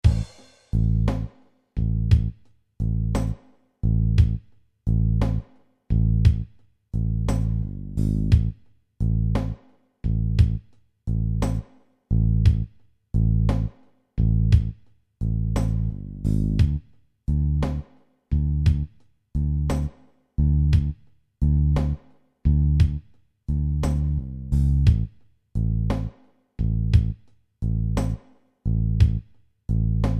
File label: Three Chords tempo 58